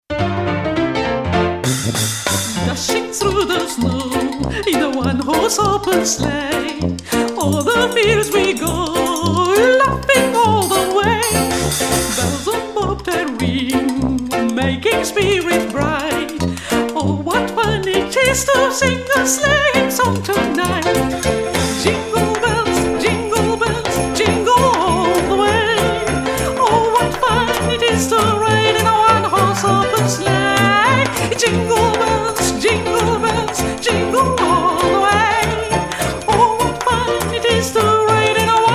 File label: jingle bells; england